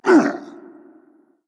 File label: hitreact human male large